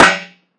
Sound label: Fracture Snare, Found Percussion Hits; UNUSUAL KITS and FX; ModeAudio